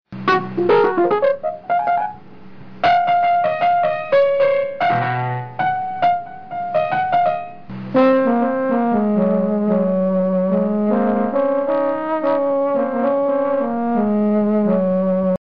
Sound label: piano batida